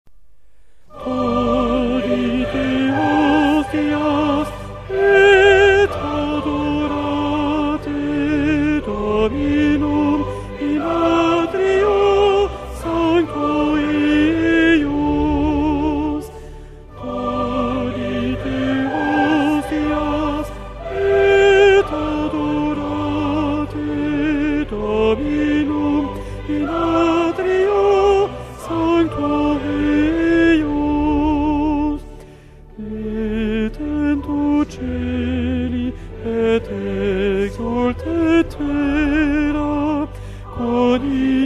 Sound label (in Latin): tollite hostias, tenor; Tollite hostias (G.Demurger)